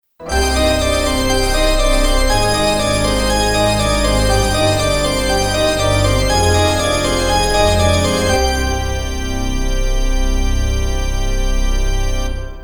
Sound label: organ solved, music; Eternal Darkness Requiem